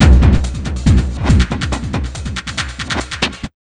140, Big Beat Drumloops; USB Soundscan vol.25; AKAI

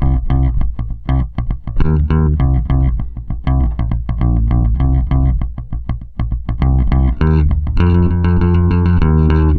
COOL JZ 100, Bass Grooves; USB Soundscan vol.30; AKAI

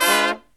LOW CHORD, Killer Brass Riffs

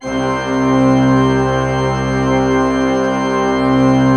C2, Cathedral Organ; ILIO; Propeller Islands Cathedral Organ

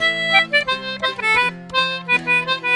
C2 R, Celtic Flavours; USB Soundscan vol.42; AKAI

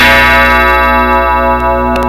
Yamaha DX5 BELL SYNTH; Rubber Chicken Software Sample Bank

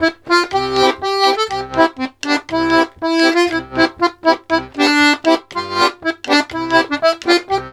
POLKA, Accordions; USB Soundscan vol.40; AKAI

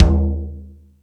Rap Session, Drum Machines

